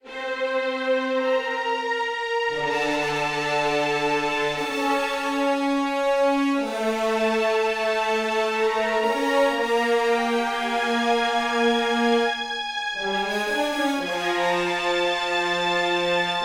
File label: String; The Bureau XCOM Declassified